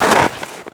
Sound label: foot snow deep, footsteps